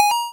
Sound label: pickup; fife-parpg; shooter